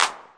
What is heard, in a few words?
clap, drums single hits; Claps